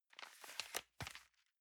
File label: envelope swap soft